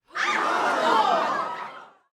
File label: CROWD SHOCK; SIMUZENSOUND